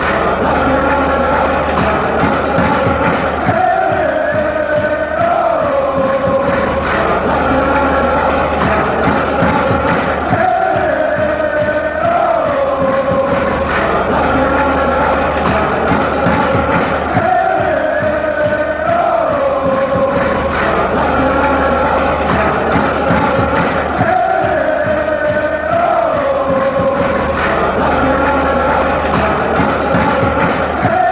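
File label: fanchants, football chants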